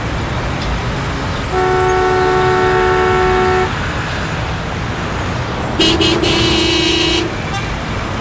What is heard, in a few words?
traffic mush